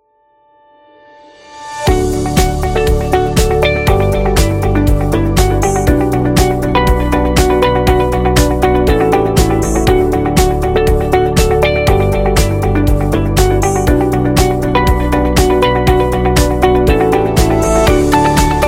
Corporate Happy

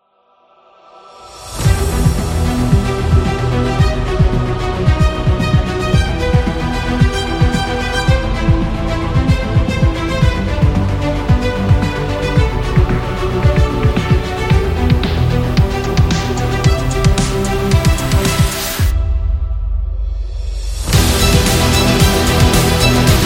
Epic Drama

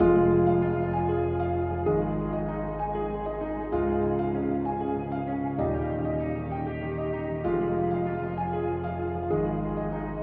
Epic Victory

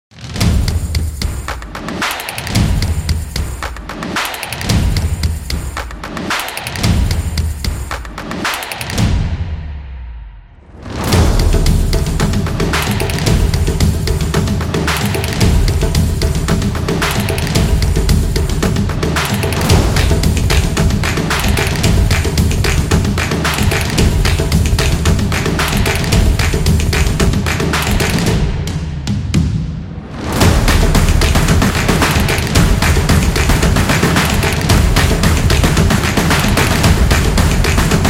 Active, sport drums

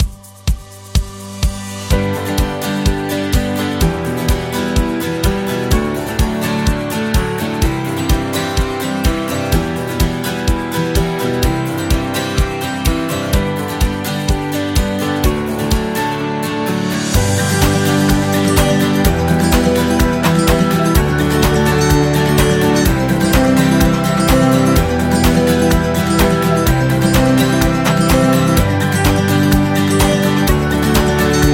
Happy Acoustic Guitar

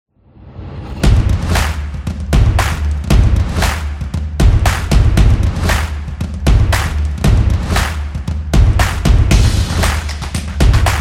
Beats; sports beat

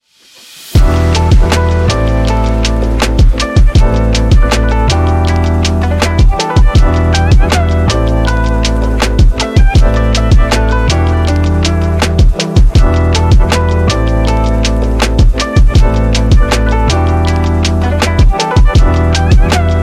Wind Vibes, lofi chill hop

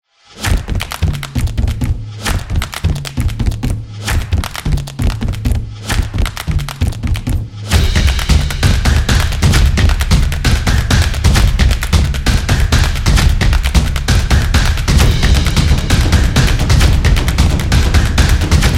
Leader, soccer drums

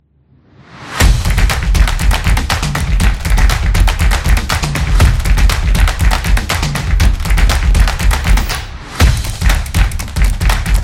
Action; office background

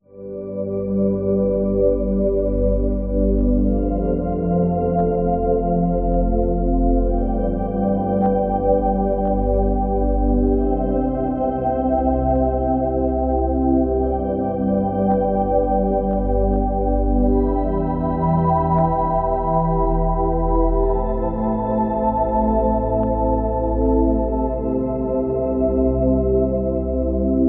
528 Hz Healing Meditation; soundscape

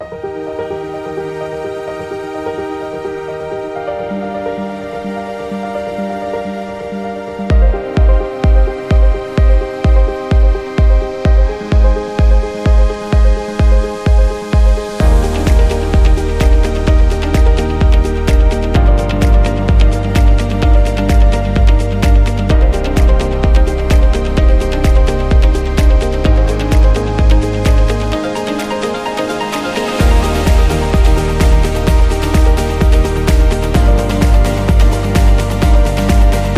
Corporate Presentation

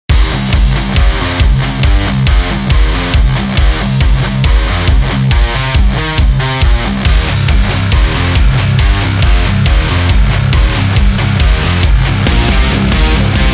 Metalized Metallic Metal

